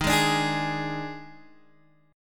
Eb Major 7th Suspended 4th, Guitar Open G; down

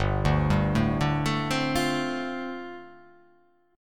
G 7th Suspended 2nd Suspended 4th

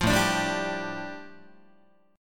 A 7th Suspended 4th Sharp 5th A, Guitar

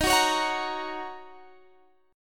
D sharp Minor 7th D sharp, down, Guitar, Standard; strummed; JGuitar

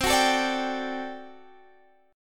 G Major 7th Suspended 2nd Suspended 4th

